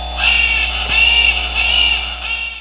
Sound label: macaw